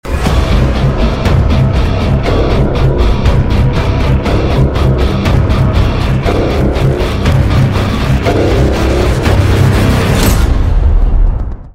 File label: intro music; ff2; zanki